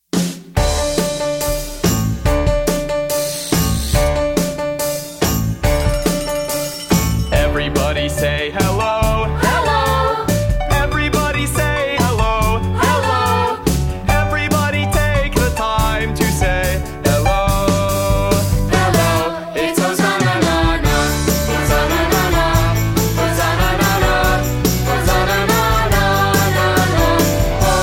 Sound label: Welcome Song